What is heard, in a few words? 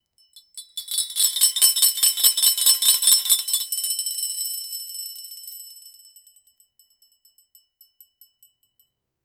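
extremely fast sharp whoosh; agile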